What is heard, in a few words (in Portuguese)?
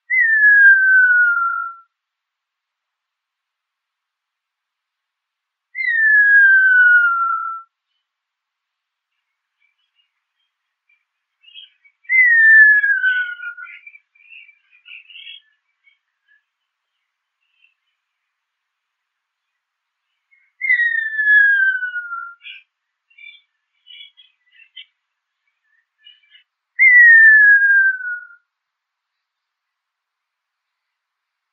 Canto da Ema